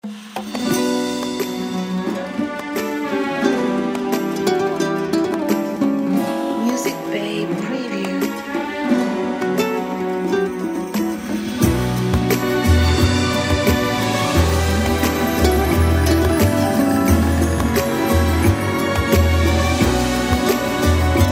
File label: Sensual Sensations